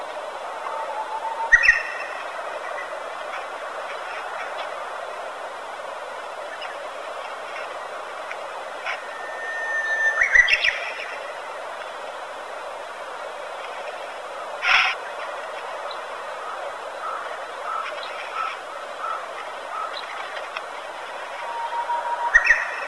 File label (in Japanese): uguisu